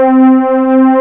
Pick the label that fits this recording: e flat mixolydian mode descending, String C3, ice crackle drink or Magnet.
String C3